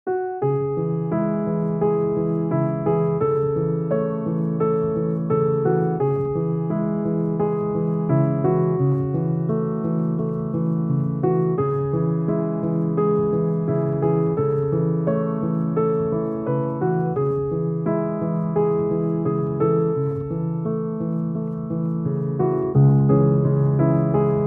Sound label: Relaxing Classical Piano Music